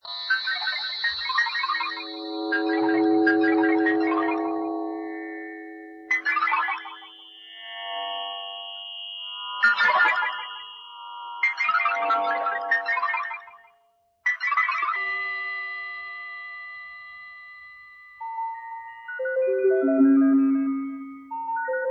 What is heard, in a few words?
Electronic